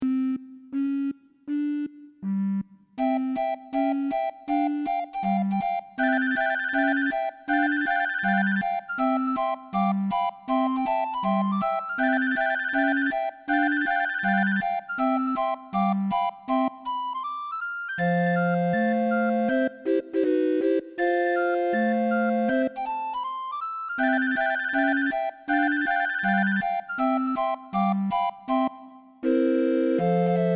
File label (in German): Trillerpfeife